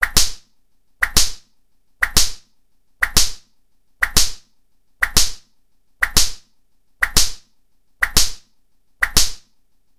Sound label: whipping; jidaigeki